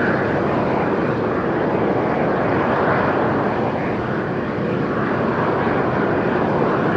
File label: distance airplane jet idle; Hearts of Iron IV Death or Dishonor